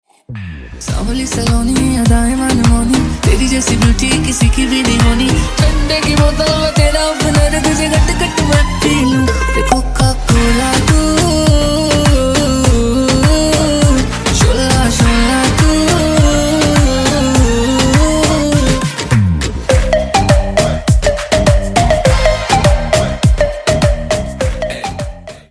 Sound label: Remix Bollywood Ringtone